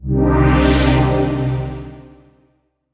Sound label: Synths Root C